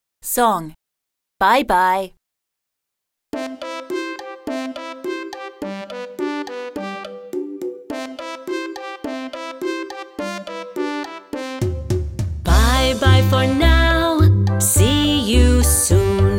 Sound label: Bye bye song